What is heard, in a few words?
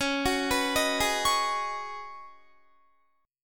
Db 7th Suspended 2nd Suspended 4th, Guitar; 21,21,21,20,20,20; Db7sus2sus4 arpeggiated